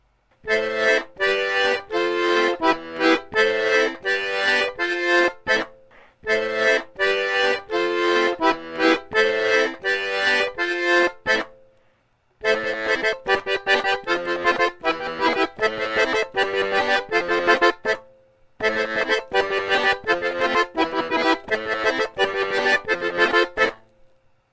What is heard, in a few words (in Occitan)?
La filha d'un paure òme (intro ou accompagnement)